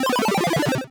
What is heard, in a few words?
bomb; mine sweeper epoch times